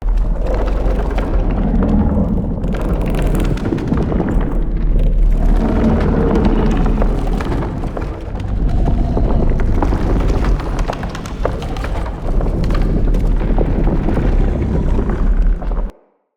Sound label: Root Cave Root Giant Loop, Ambience; Props; Neathuns Root Cave